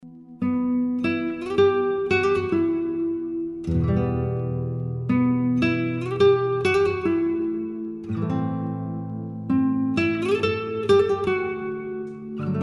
Amazing Chill Guitar; CHILL OUT SUAVE

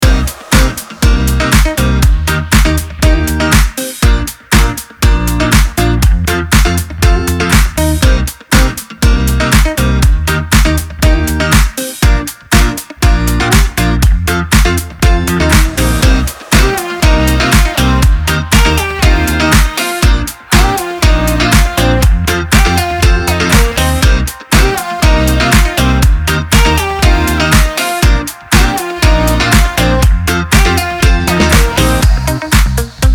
Happy Funky Pop (Loopable)

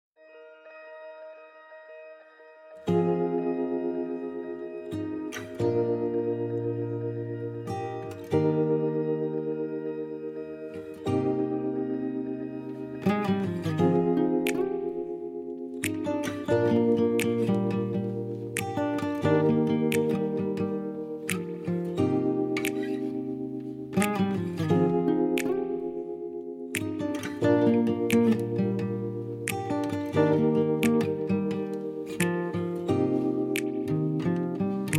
Instrumental; Pop instrumental

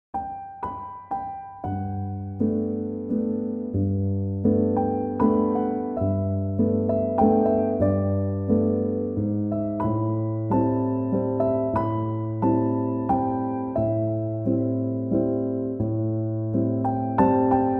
piano instrumental; from Up

